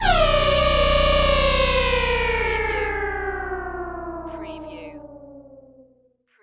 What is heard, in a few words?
Protons Machine long decay short